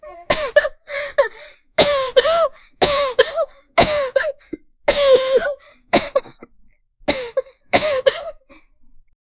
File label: young girl coughing